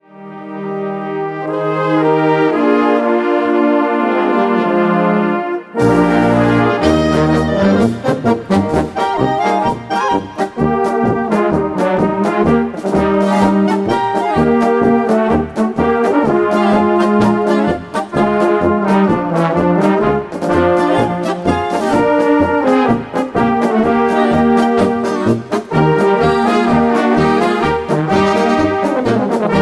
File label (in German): Alpenklaenge